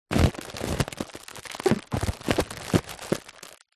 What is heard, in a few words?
foley inflated plastic bag handling